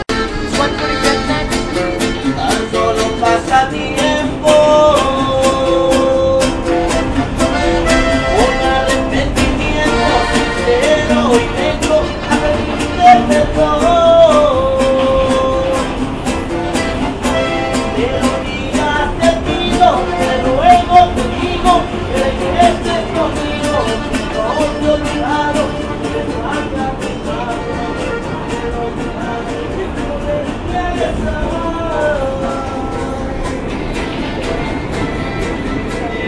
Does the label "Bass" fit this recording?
No